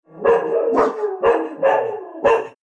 dogs barking

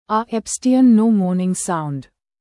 ahhh moaning sound; epstien

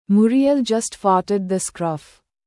farted

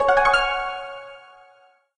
Supercell intro sound

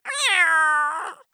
cartoon little cat meow; mixkit

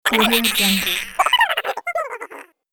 laughing sounds

